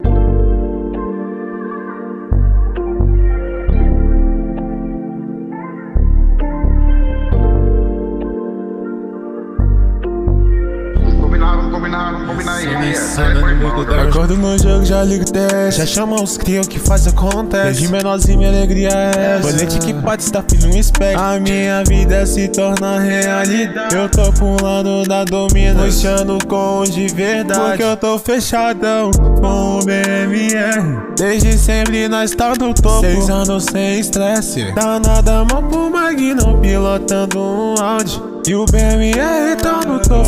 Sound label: music